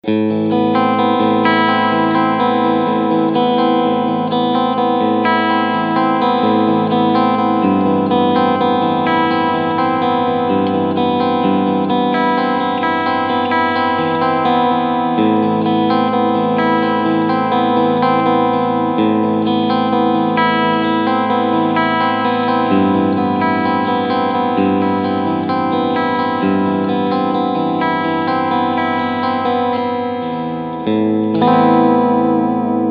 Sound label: Clean Position